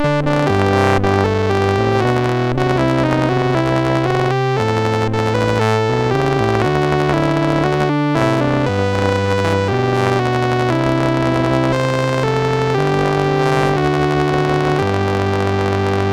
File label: BASIC sid MOS6581R4